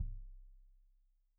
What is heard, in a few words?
Kik Stereo; Deeply Sampled Drums